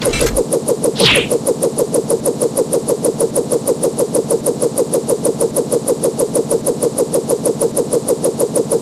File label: salute